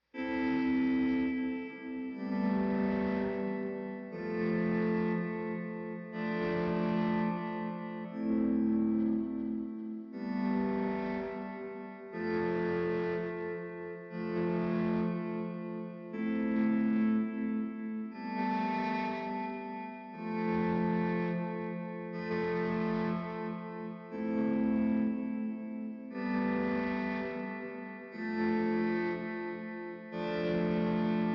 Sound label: gtrs